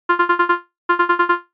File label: ring